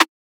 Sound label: Snare